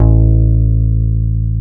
FEND P-BASS A1; Sonic Reality - Vintage Timetraveler

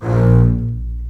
CBS A#1 MARCATO, String Collection; East West - The Ultimate String Collection

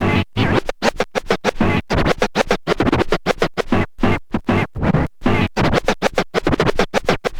scratch med, Vinyl Attack scratches mix; Masterbits

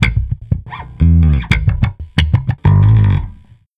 Electric Bass

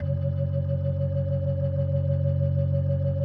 B3, First Call Vintage Keyboards

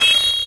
MET SW SIN, SWORDSING

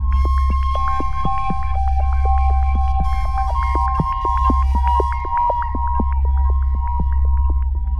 Tribal Flute Beat; Sonic Foundry (Sony Creative Software); Ma Ja Le Ethereal Textures; Flutes

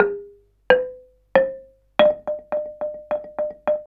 KULINTANG

